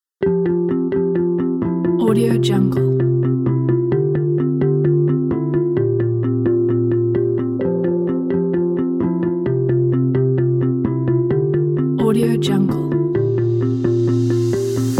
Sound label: happy